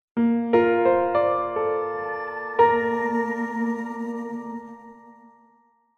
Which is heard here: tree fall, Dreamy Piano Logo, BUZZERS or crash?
Dreamy Piano Logo